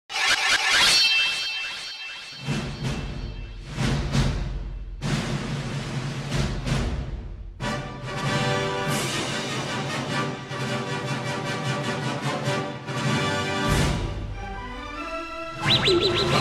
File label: funk slowed reverb